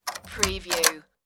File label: apartment door unlock